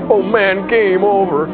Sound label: Game Over; Iraq Attack